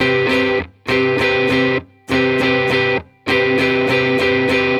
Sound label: Indierock, indie